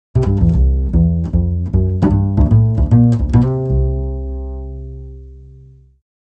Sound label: pizzicato